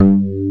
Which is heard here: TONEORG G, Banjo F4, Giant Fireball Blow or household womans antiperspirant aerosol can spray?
TONEORG G